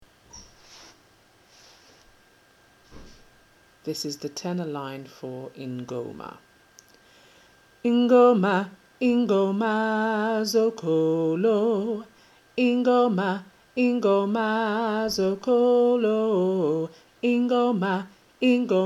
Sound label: Tenor, gospel choir; Ingoma